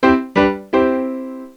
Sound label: piano